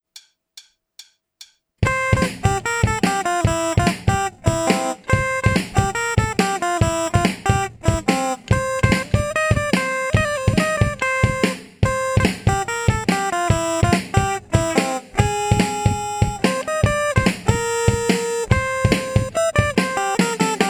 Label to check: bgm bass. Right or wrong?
Right